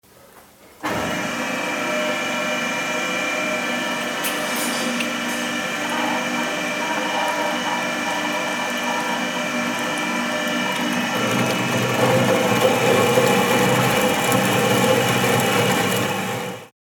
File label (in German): Saegen